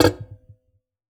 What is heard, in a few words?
Classical Guitar Extended Technique